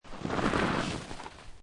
Snow And Ice Snow Movement; Weather

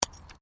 Digging Small Handheld Tool Rocks; Various digging tools and processes; Construction

